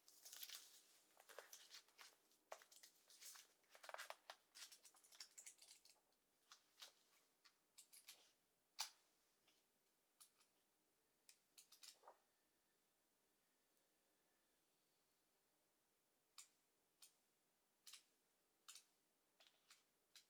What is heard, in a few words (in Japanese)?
本をめくる, ASMR; 動作系